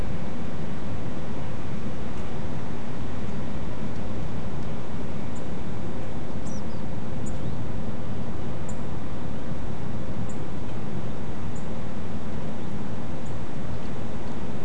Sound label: bunting sp with an up and down call at 5.5-6.5kHz; nocturnal flight call project in beijing autumn 2021